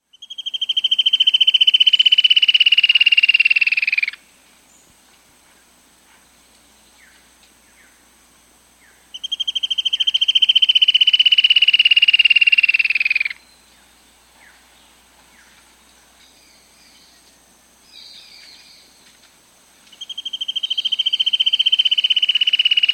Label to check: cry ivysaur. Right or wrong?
Wrong